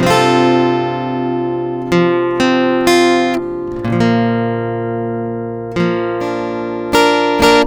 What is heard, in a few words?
Guitar 125 BPM (A# Major), Acoustic Guitar; Festival; Ghosthack - Free Guitar And Piano Samples